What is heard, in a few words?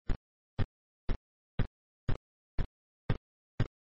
Walk; Bloxstrap; Mods